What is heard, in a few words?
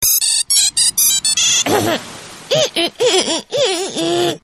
Silly Ringtone